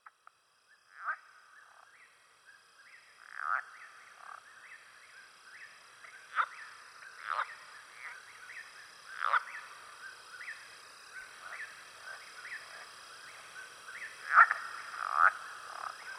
Whip-Poor-Will, Southern Toad, Southern Leopard Frog